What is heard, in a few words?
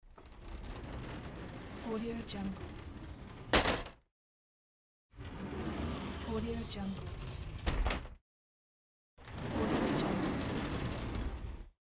door slide